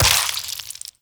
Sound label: Vial Ability Viral Shatter; Warframe; Alchemist; Elements